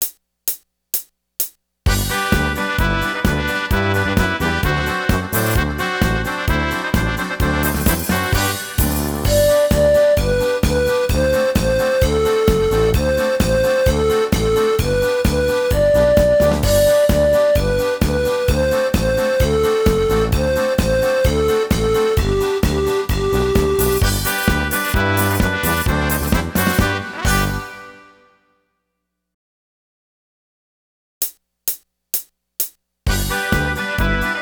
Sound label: polka